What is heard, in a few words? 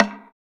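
PER PROCU, Procussion, percussion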